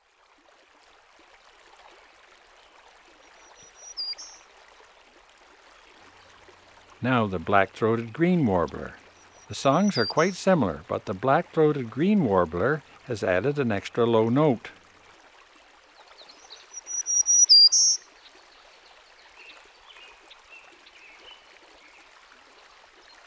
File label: black throated green warbler; dan gibsons solitudes